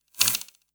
Glitch, noise

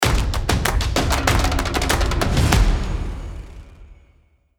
Stomp, transition

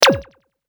Game, transition

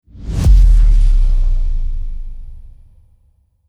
Subdrop, transition

